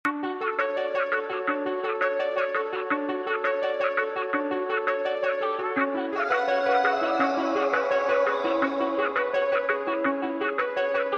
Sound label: morning piano ringtone